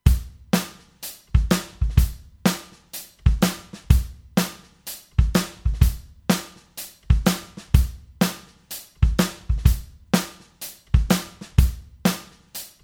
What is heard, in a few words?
Drums Original; Gyraf G23 S